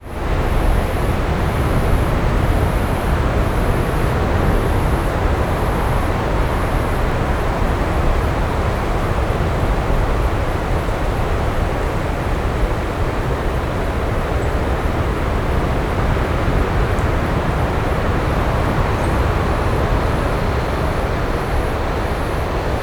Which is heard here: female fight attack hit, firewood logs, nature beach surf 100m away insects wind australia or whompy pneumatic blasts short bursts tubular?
nature beach surf 100m away insects wind australia